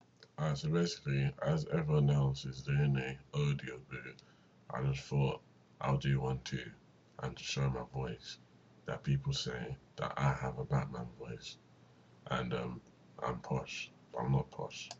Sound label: yeaaah